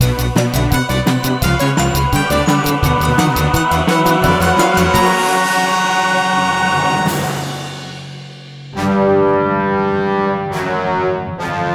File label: Lose; NISMUSIC Zomburbia Zombies